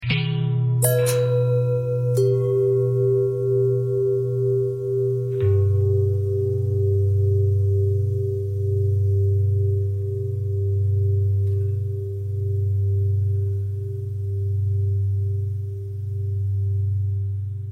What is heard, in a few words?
long bell long bell thread simple, bells